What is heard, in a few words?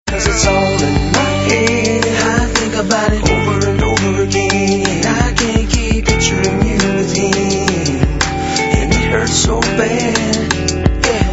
ringtone